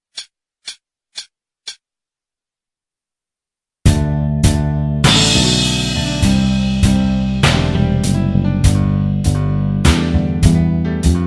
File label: solo backing, 50